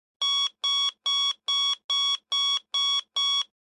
Digital alarm clock

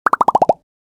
Line graph decrease animation infographics

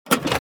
Ammunition box metal case open; Military Equipment

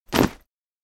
School bag backpack drop on the floor; luggage

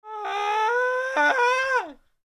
Man screaming gradually increasing intensity

